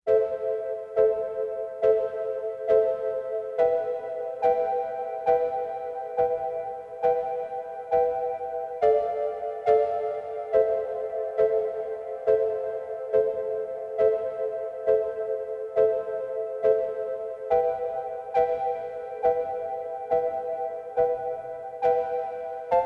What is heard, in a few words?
Solo Organ; Nordic Drama Textures